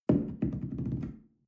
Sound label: shell place on tablecloth wobble medium; soundpool